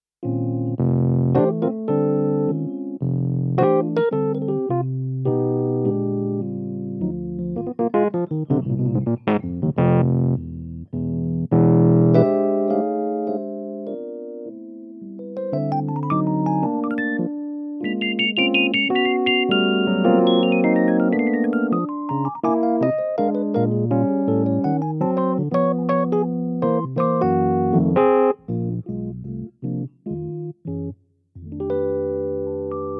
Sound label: stagepianos kawai rhodes